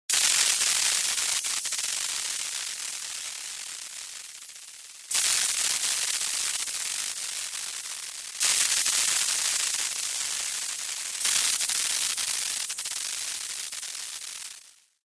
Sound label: SHORT CIRCUIT